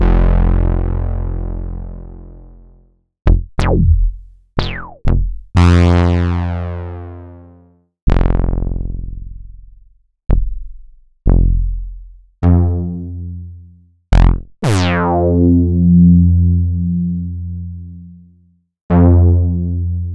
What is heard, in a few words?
ARP 2600 Bass